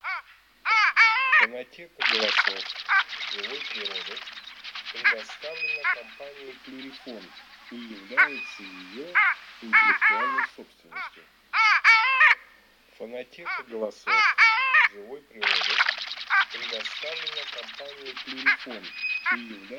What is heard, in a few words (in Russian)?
Морянка Moretta Codona